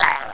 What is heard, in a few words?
orc small hit; wesnoth